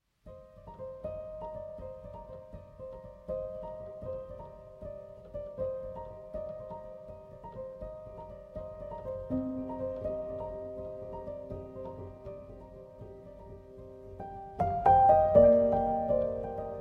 Solo Piano Version

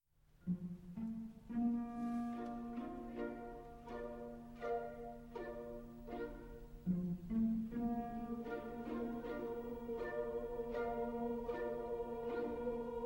Coro a Bocca Chiusa (Humming Chorus); Madama Butterfly; Act 2

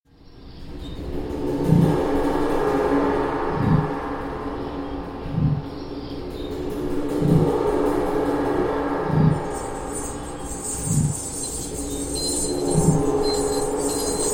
Drum Dance

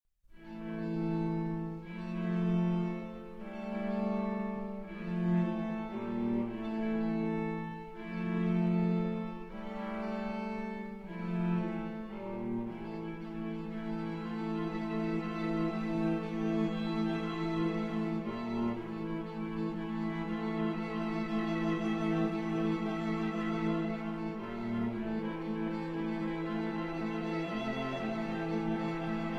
String Quartet

